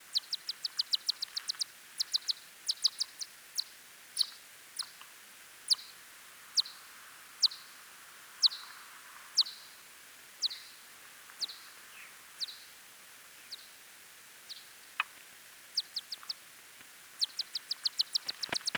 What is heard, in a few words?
Nyctophilus geoffroyi